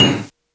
Perc Matsuri Casio SA-1

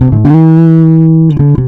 BARBY BASS; LOOPBASS KIT; AKAI; Big Beat Samples & More

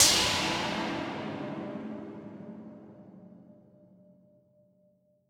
Noise Verb Decays; stereo toolkit samples

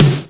wet snare; Greytsounds; Scott Peers Sound Engineering

